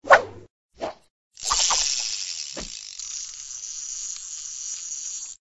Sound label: fishing pole